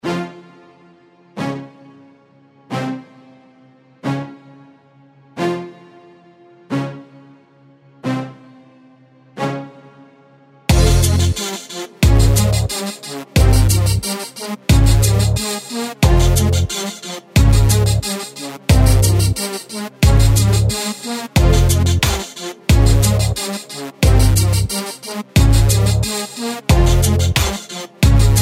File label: reggaeton